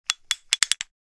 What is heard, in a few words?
Metallic clinking rattling short